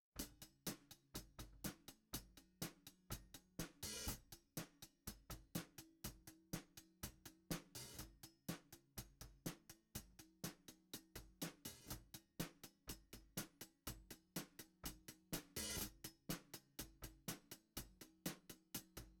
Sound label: hi hat